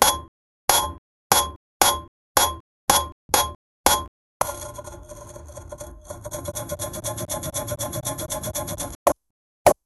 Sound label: realistic slow, time capsule